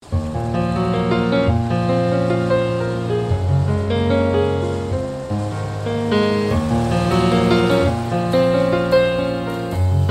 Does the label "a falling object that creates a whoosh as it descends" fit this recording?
No